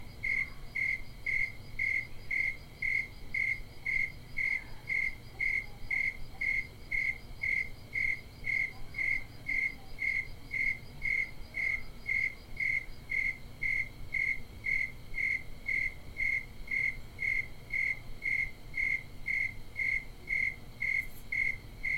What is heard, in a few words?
Bisbee Crickets, natural sounds